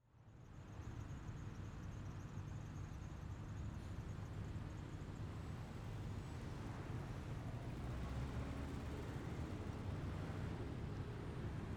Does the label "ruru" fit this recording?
No